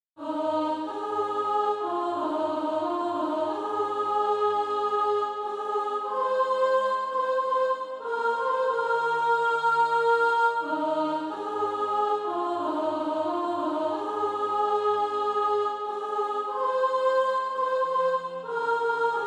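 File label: soprano, Practise Music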